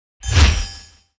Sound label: Snow Man Attack; xmas